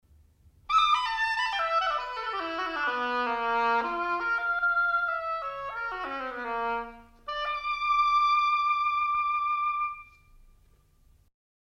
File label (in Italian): oboe suono; strumenti